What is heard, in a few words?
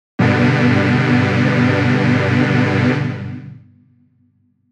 JP Pad Mach, jup8